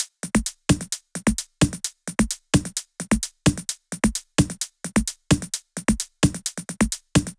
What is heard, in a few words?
130BPM Kit; Vengeance Samples; Comboocha Kit